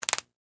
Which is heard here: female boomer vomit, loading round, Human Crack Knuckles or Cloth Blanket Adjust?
Human Crack Knuckles